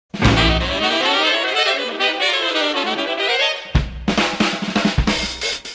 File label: bebop